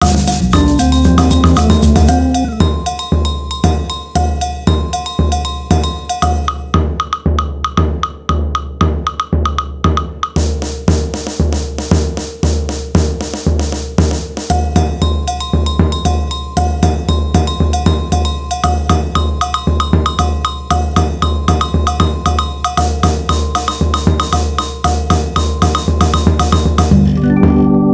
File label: Samba Limbo BREAK; SO Clips